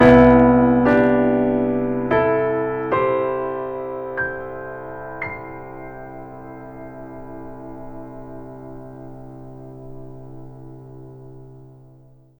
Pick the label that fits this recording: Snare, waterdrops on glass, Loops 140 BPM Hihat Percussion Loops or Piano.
Piano